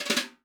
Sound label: brush; Northstar - New Gold VOL-2; 50S BRUSH